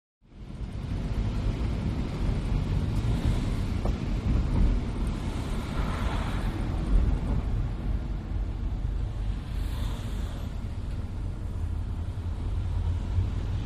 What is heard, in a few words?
Interior Car Driving, wet traffic